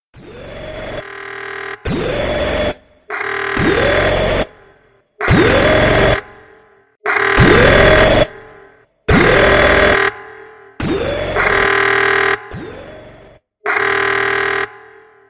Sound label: 3D Alarm Sound